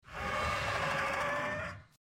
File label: Ptera BroadCast, Ptéranodon; Jurassic Isle RP